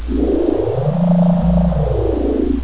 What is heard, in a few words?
doors; dwho